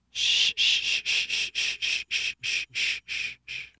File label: The Soothing Shush